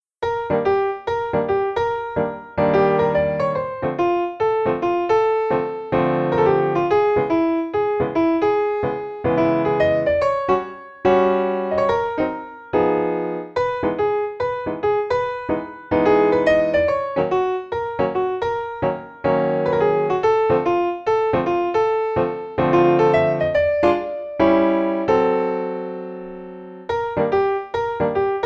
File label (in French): Piano seul